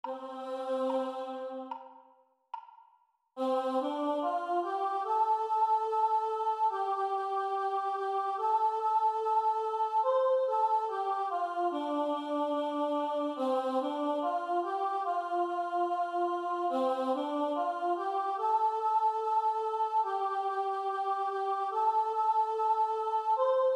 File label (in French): Chant